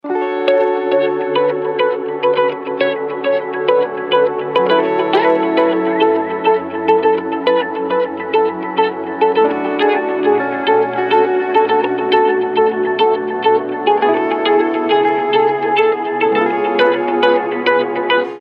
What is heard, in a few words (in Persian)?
ring khareji